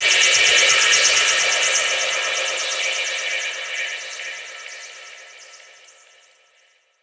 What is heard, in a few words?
FX Downlifter